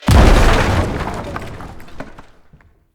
Mace Entrance Door Smash; Level Events; Midrath

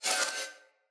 Split Sword Accent Heavy; Weapons; Player; Swords; Starter

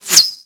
Arrow Whizzby; Warframe; Bullet Impacts; Whizzbys

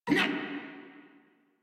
Pain Crewmen; Warframe; Corpus Crewmen; Crewman D

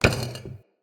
Vase Medium Impact; Vases

